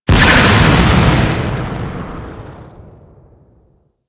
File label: Grenade Launcher Explosion; Warframe; Weapons; Corpus